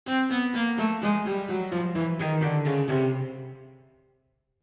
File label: Cartoon cello descend